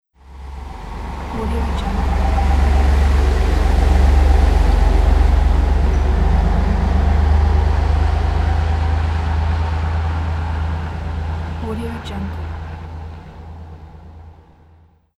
truck drives by; soundfood

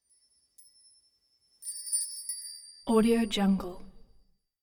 sparkle bell tree wind chimes

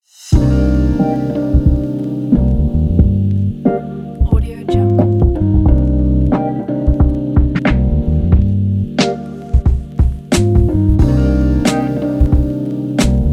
lounge chill hiphop beat

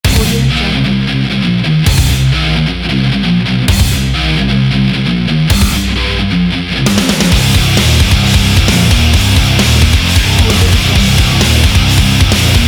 hard rock logo

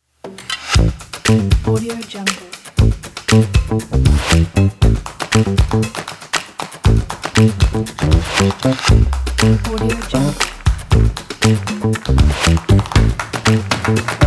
funky bass logo

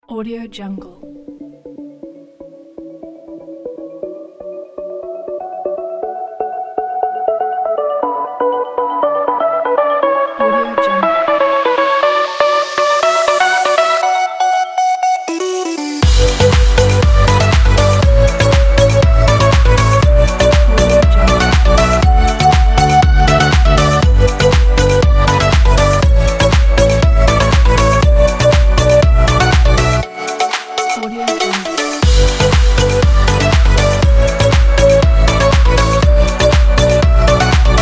beach dance pack

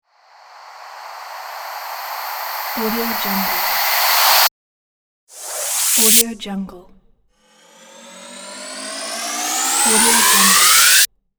risers

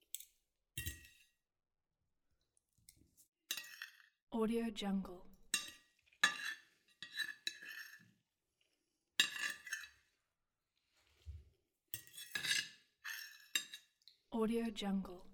silverware eating loop

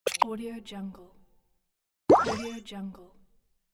ui bubble click

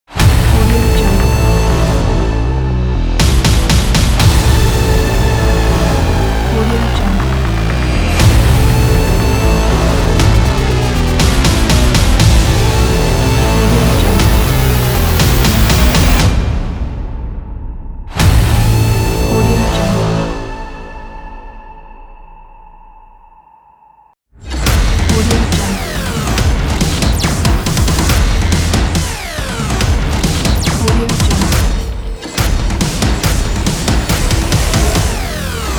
cinematic trailer ident pack

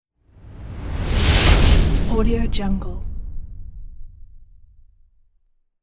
title whoosh; mountainaudio

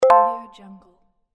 collect item

